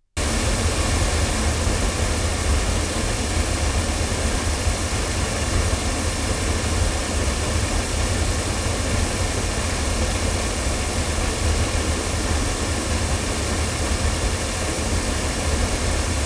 Earthworks M30 loudspeakers, microphones

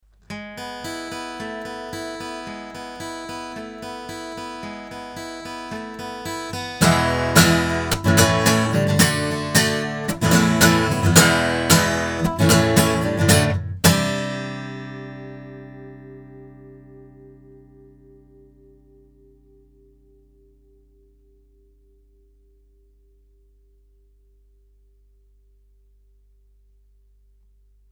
MT184S guitar; TSM

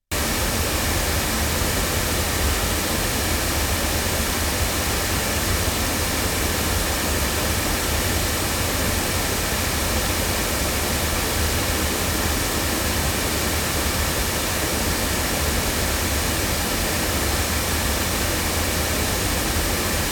SKEC010B loudspeakers; JJLabs

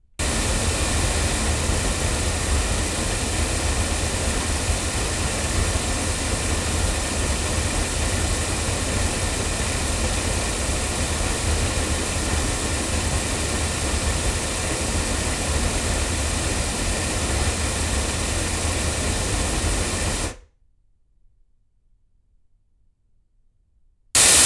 Oktava MK319A loudspeakers

